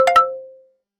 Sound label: double ding